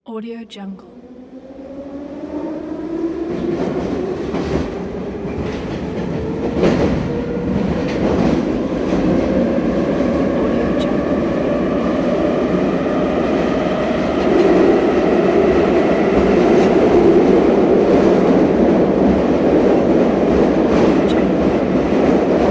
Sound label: subway wagon inside